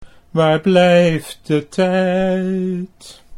zang, Dutch

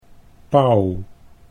pauw

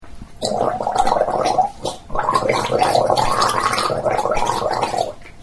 gorgel geluid

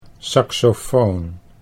saxofoon

